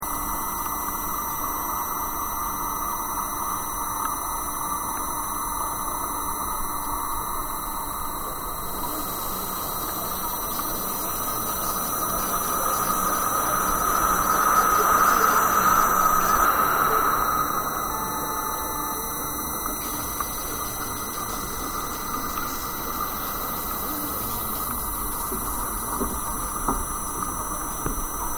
PUMP MOTOR UNDERWATER MODULATING LOOP, Fountain pump operating underwater; Machinery Sound Effects; Machinery (Sound Effects:Machines)